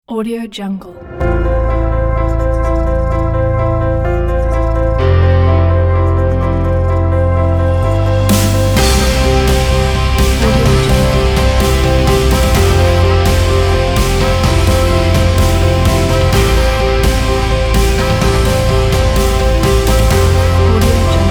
cinematic epic energetic kaboom

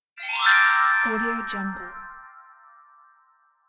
magic wand

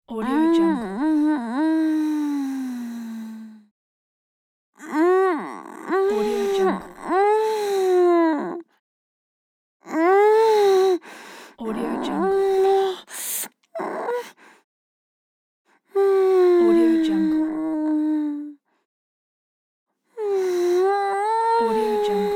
woman voice pleasure muted

